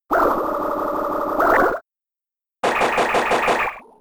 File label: Bubble; Bubble Beam